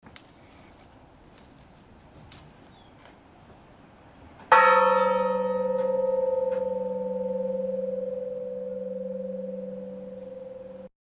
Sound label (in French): cloche; Mosset, cloche 3